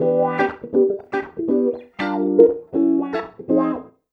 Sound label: 120 WAH WAH, Electric Guitar Loops; USB Soundscan vol.04 - Electric & Acoustic Guitar Loops; AKAI